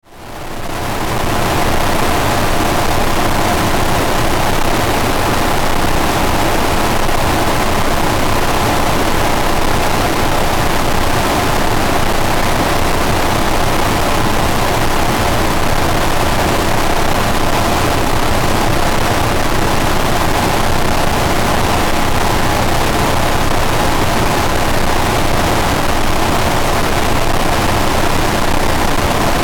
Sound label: DRONE DISTORTI, Drone Distortion Harsh Static; Blastwave